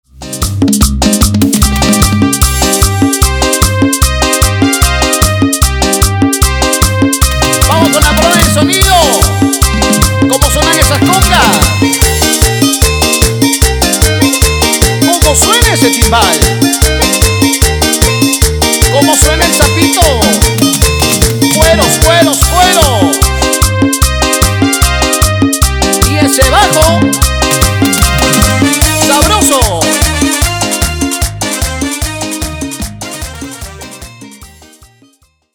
Instrumental Chichero, Bpm 150; Dimax dj Mix

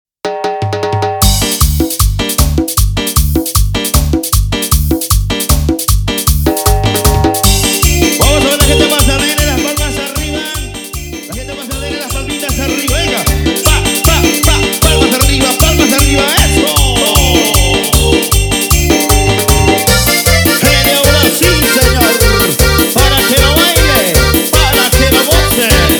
Cover Instrumental, BPM 154

